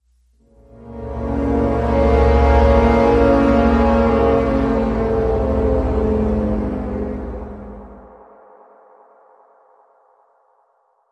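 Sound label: Bend Foreboding Brass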